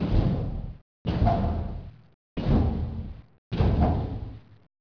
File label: vent steps